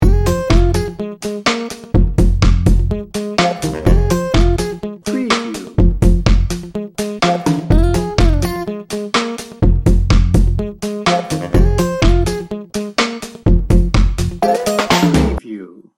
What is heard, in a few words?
party player loop